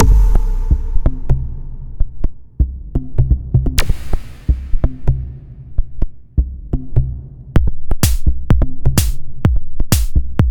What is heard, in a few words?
dsp drums; ace